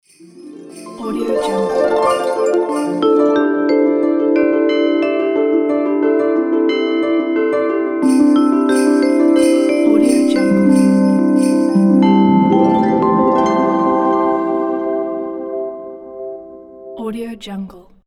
logo new years eve

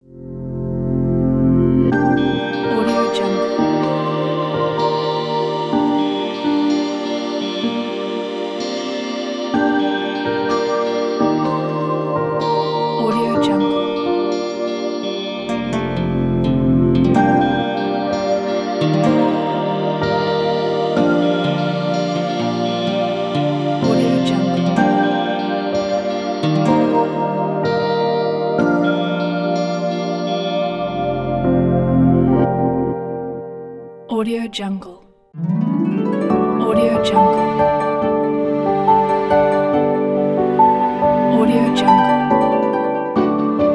logo corporate pack; zigro

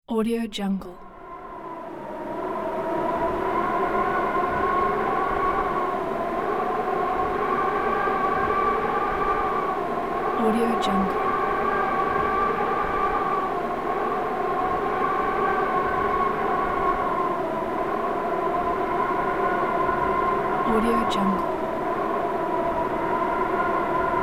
howling wind

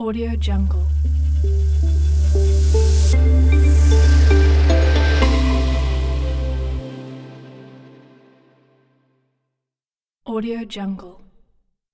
clean intro logo; 4restmusic

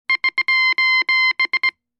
morse code sos beeps; sound-ideas

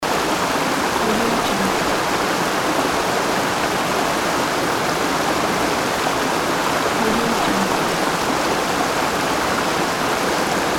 waterfall ambience loop

